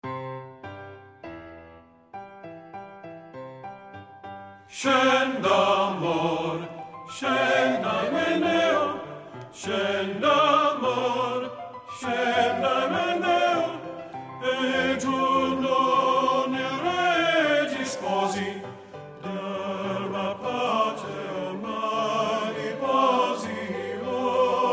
Scenda amor, tenor

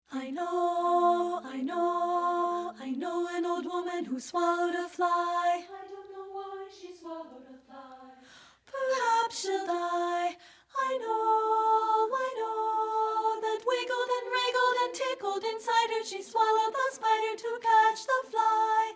I KNOW AN OLD WOMAN SOP 1

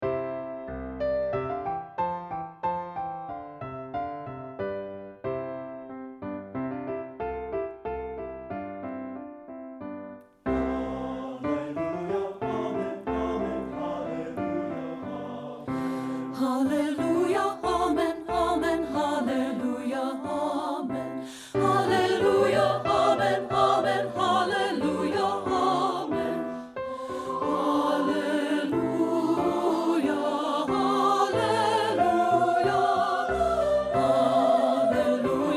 HALLELUJAH AMEN ALTO

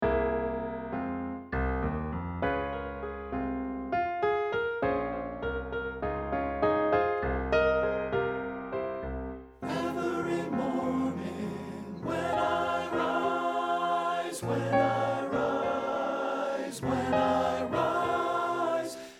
SWING DOWN THE CHARIOT TEN

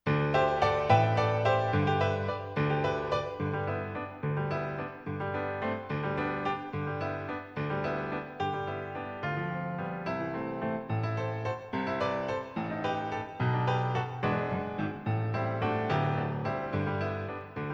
Corrido de Macario Romero TRACK

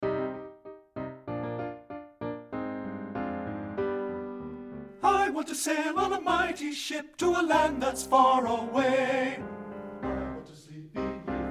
MEN TEN

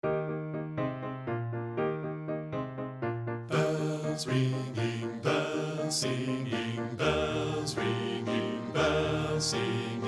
JINGLE BELLS BASS

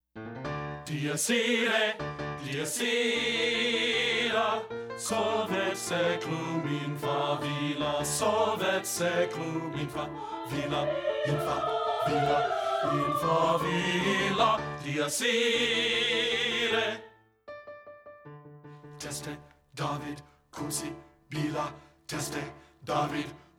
Dies Irae bass

